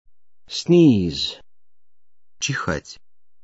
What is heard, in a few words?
sneeze